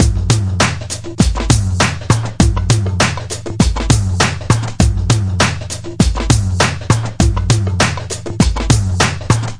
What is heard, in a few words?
amazon roknroll, drum loops and breaks, 100 bpm; 091 to 100 bpm